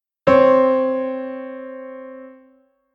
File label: do do sharp oct